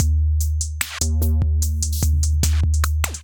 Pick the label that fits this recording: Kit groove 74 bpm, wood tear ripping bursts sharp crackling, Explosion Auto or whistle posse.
Kit groove 74 bpm